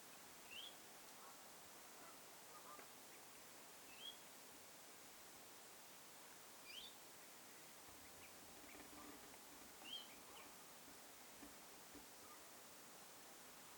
nuori pajulintu lintutornin vierella

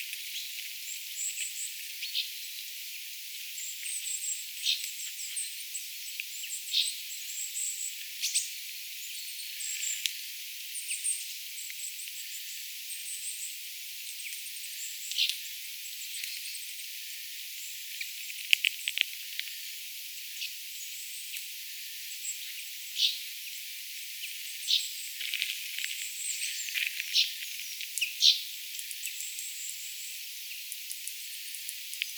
nuoren peipon aania

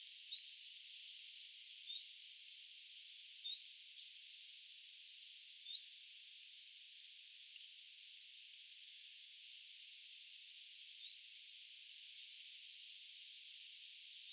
puutarhassa hyit-tyyppinen tiltaltti

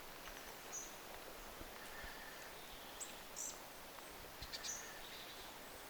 onko tuo rautiainen

